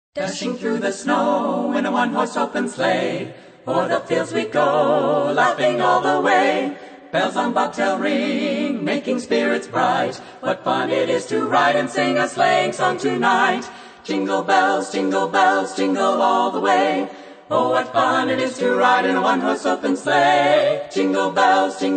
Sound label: Trad Jingle Bells